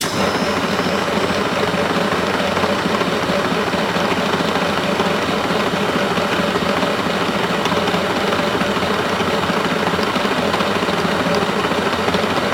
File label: Mill Type 2